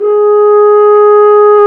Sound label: BRS F HRN, FRENCH HORNS; Roland - LA Composer vol. 1